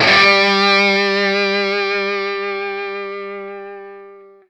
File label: SINGLE G, Vintage Blues Guitar, ELEC.GTR; USB Soundscan vol.22